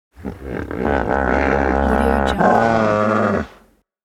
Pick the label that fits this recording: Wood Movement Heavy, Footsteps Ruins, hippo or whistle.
hippo